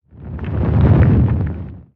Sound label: Spinjitsu Earth One shot